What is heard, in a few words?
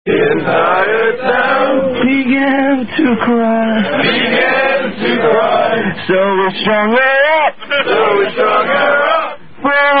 Singing; More Survivors And Crabs Singing; Ring Dang Do